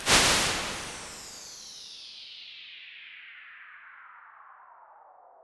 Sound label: large reverb